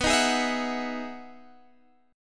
E Major 9th B, chord

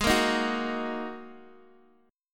G sharp 7th Suspended 2nd, Guitar, Open D; x,x,6,4,6,4; down; chord shape

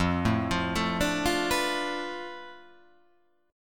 F 6th Flat 5th F down, chord

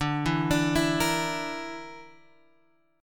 D Suspended 2nd Sharp 5th D, Guitar, Open G

